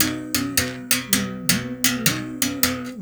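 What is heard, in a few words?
BS BERIM GRV, BERIMBAU; Spectrasonics; Heart of Africa 2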